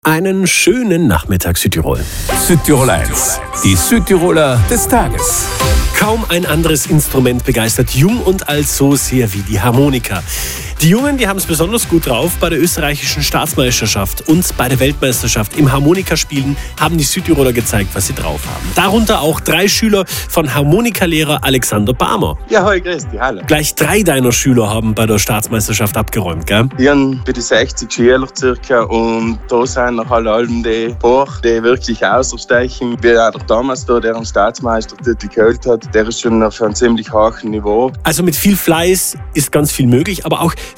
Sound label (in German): harmonikaa